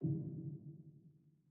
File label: walk; ゆめ2っき